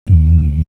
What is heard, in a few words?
Beatbox; AMG